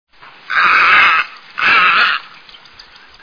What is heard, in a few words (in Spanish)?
canto Garceta Comun